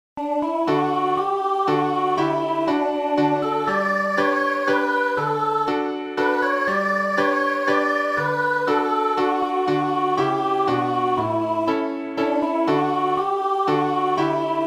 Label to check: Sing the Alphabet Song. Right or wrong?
Wrong